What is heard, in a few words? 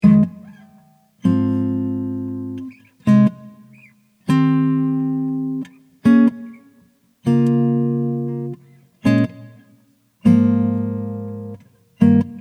inversions on guitar, stepwise voiceleading